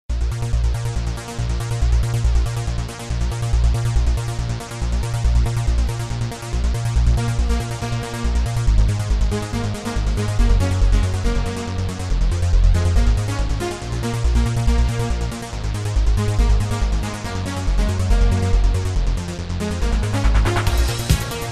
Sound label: Synthetic soundscapes, spacesynth